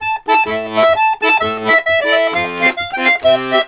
Accordions; USB Soundscan vol.40 - Complete Accordions; AKAI

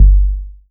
SMASH IT BASS, Bass Analog & Synth, RAGGA; USB Soundscan vol.12; AKAI

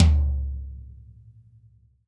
TD-20 Tom A, Roland TD-20 Toms

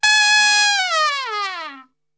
G#3, Brass; Best Service; Brass Super Section; TRP LNGFLL

